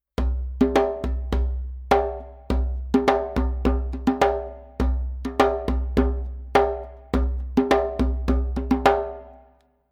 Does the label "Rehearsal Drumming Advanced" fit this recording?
Yes